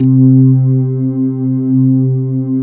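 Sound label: rotary organ C3; ROTORORG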